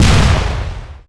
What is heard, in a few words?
rocket fire; Rabbit-Wars